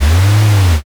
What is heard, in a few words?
servo